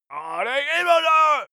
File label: male shout angry